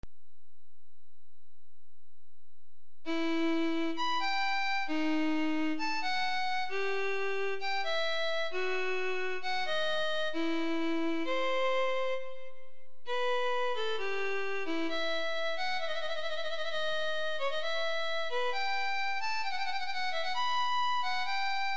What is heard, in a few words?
violin adagio one part; telemann